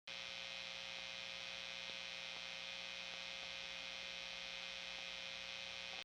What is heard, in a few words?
Amp Guitar Noise No Fingers